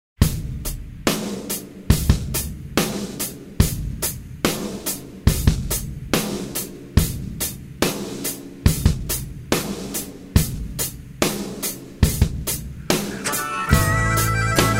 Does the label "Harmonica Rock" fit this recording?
Yes